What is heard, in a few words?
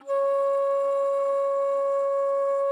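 C#3, bass flute; Xsample VOL-2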